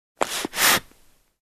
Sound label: Air pump once